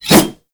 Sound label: Tonfa Whoosh; Warframe; Tenno